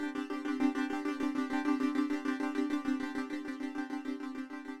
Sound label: RHYTHM CRDS; Vostok Inc.